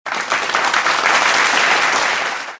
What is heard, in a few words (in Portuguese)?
Aplauso